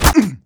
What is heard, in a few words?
pain, player; Team Fortress 2